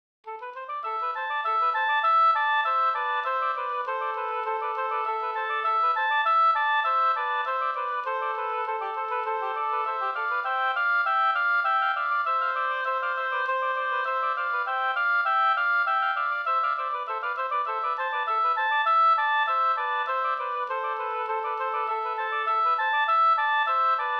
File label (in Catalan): Toc de trabucs; d'Esplugues